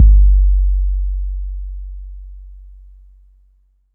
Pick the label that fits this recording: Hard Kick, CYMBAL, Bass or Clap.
Hard Kick